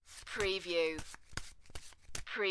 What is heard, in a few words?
Cards Playing Cards Shuffling